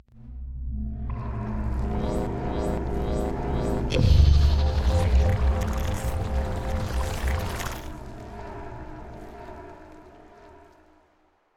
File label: Eyeboss Intro; Super Mombo Quest